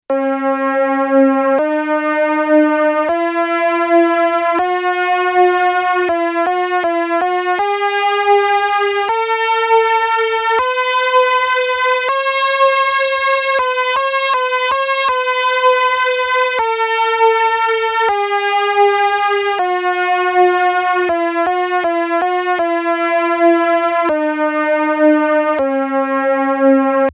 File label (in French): gamme majeure zarlinienne